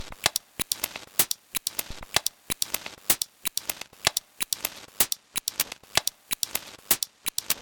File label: Minloop 126 Bpm